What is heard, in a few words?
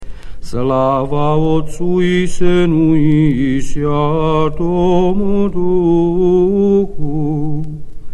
Tone 1 Stich; PappIrmologion